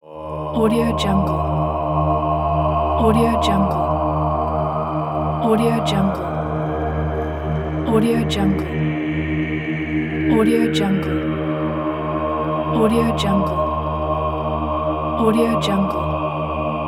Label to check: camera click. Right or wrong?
Wrong